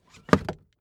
car seatbelt buckle hit hard

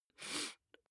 nose sniff male sick soft; koerpergeraeusche; menschen; soundpool

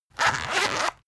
zipper run long; objekte; reissverschluss

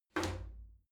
shower slide door open hit soft

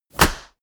clap, percussion

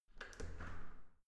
entrance door open normal

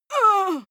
female hit; soundpool

